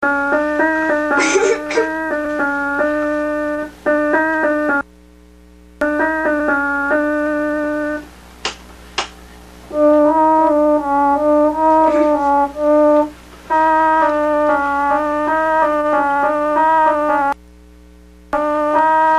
keyboard

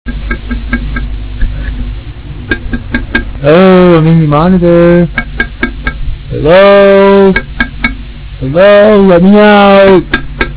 tap tap

